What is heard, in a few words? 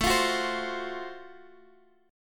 Gb Minor Major 7th Flat 5th, chord